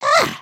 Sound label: soccer